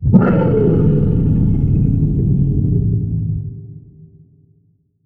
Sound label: monster; MonsterVoiceお手軽パック